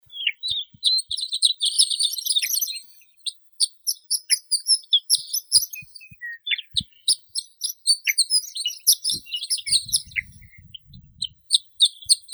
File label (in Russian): zhavoronok rogatii eremophila alpestris, zvuki prirodi